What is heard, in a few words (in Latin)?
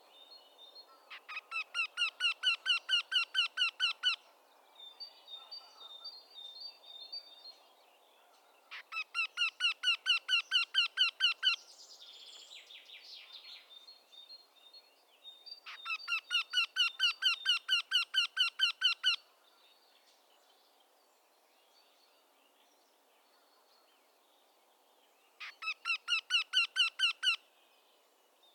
Jynx torquilla